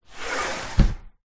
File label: household window slide down close; zapsplat